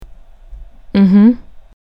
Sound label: Mmhmm